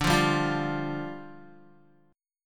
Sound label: D Minor Double Flat 5th, down, Guitar